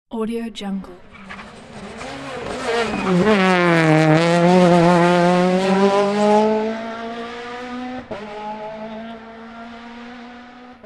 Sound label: fast racing car on curve